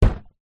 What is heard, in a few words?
impacts plastic bin large; zapsplat